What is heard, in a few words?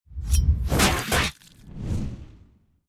Skill Iron Spike